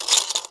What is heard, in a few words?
get coin